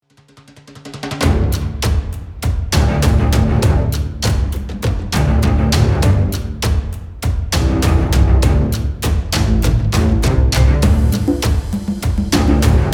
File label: music; rpg